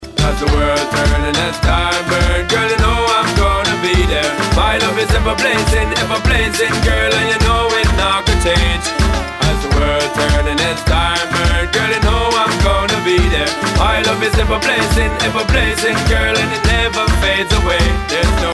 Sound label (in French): Sonnerie